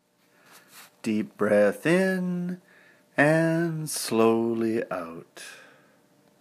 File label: Deep Breath